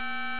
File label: ALARM; X Wing Tie